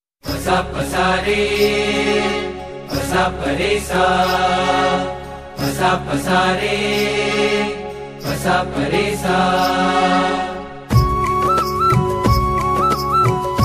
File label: Dhun; Swaminarayan Ringtone